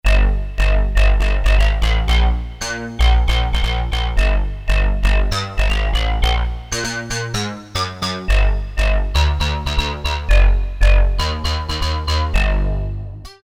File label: BASS, Metal-Metalism, Korg DSS-1